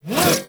Bullet Jump Pre Land, Motorcycle; Warframe; Motorcycle Ninety Nine

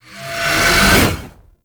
Uriel Brimstone Cast Riser; Warframe

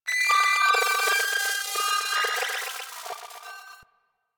Venus Corpus Data, Ambience; Warframe; Venus Open World; Venus Landscape

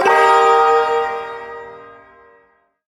Shawzin Blues Chord

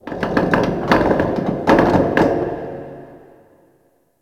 Ice Metal Creak, Ambience; Warframe; Corpus Ice Planet

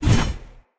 Spear Heavy Whoosh; Weapons; Player

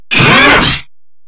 Exertion; Enemies; Vocals; Warframe; Grineer Hammer Boss

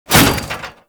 Restrained Foley, Worker; Warframe; Characters; Solaris

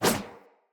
Sword Whoosh, Weapons, Player, Swords; Garren Sword

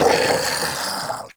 Flying Mouth Death; Enemies; Warframe; ManInTheWall

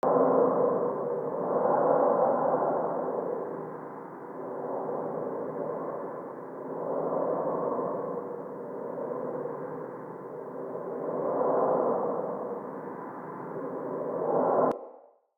Cave Amb Loop, ambience; Caves; Glades Of Andurin

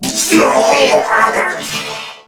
Death; Warframe; Corpus Crewmen; Crewman C; Dialog Barks Battle

